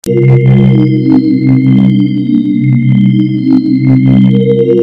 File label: Loop